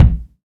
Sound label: BD, korgdrums